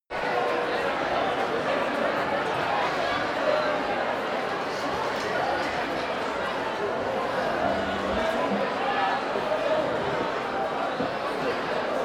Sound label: mumble big audience marquee front